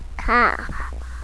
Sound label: car